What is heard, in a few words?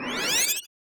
spell